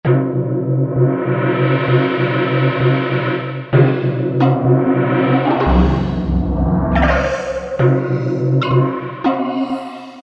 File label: Synth Gong, Korg T3